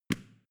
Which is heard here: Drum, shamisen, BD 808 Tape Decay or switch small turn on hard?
switch small turn on hard